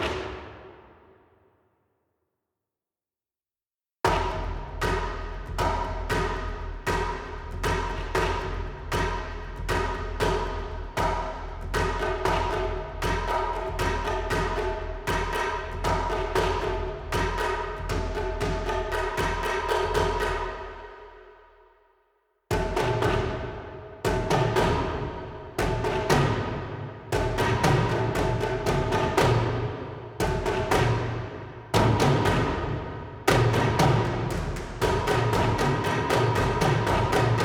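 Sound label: Heavy Blades Orch Perc; Grineer; Grineer One B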